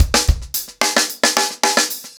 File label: Hip Hop 110BPM, acoustic drums drumloops